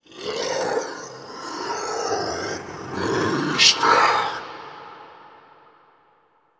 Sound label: skull fly